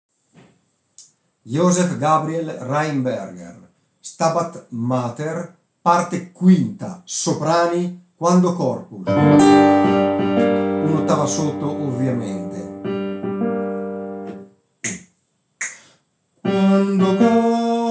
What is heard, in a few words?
Stabat mater Soprani